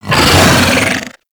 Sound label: Pet Vocal Angry; Warframe; Alad V Pet